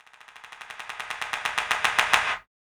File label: CLAP FX